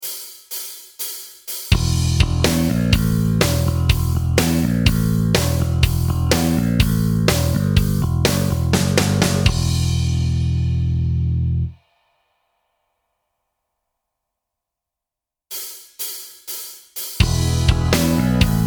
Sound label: backing track